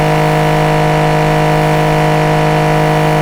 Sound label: high, vehicles; fordrafa; nfs heat ganimator pack